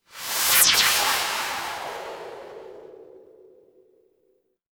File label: magic scifi teleport